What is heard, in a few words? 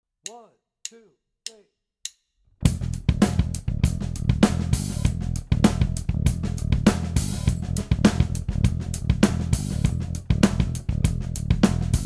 groove